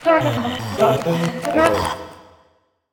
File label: Speaker Attack